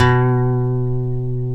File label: C#, funk guitars; Spectrasonics; Hans Zimmer Guitars VOL-1; TAKA FUNK